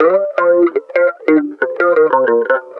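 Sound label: guitar, Roots Funk; USB Soundscan vol.41; AKAI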